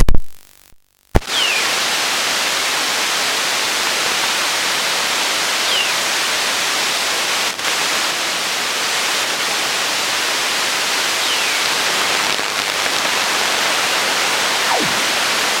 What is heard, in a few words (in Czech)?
vrapenec velky, denni ukryty